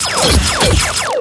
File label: Attack; Zeus